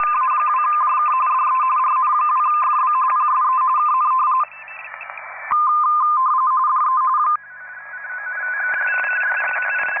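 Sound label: RTTY 45 baud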